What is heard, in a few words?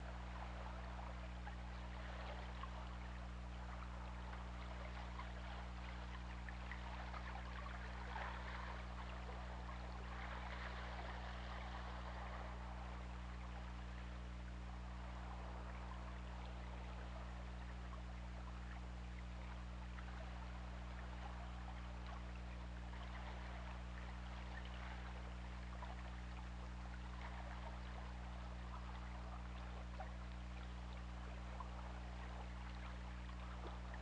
Orca; OrcaLab